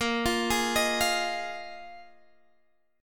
F Minor 7th Bb

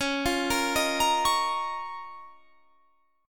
Db 6th Add 9th Db down; Db6add9; arpeggiated

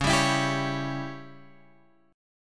C sharp Diminished C sharp down Guitar Open G

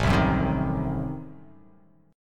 A Diminished 7th A down

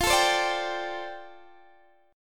F 6th Add 9th, Guitar Open D

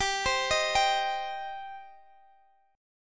G Suspended 4th Sharp 5th, Guitar, Drop D

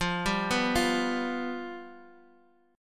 F Suspended 2nd Flat 5th

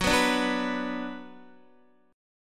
Gb Suspended 4th Sharp 5th Gb, down, Guitar; strummed; JGuitar

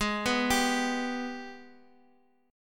Minor Major 7th, Guitar, Open D; Gsharp; x,x,x,2,2,5

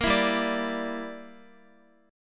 G Minor 6th, Guitar; Gm6 strummed; x,x,8,9,7,9

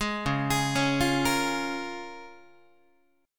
C sharp 6th C sharp Guitar; arpeggiated; C#6; JGuitar

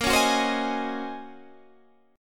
Eb Minor 13th Bb

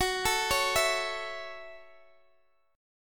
Gb Minor 7th Gb Guitar DADGAD; x,x,16,14,16,14; down; chord shape

